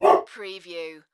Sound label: Dog Bark